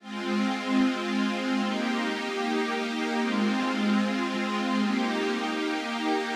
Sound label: pad